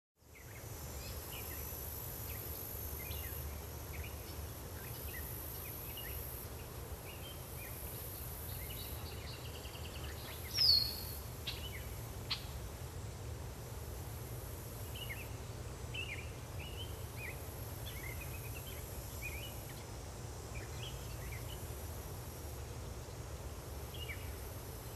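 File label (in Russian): звуки деревенской среды щебетание птиц звуки цикад шум ветра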